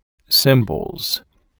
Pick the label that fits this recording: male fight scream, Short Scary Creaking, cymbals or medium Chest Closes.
cymbals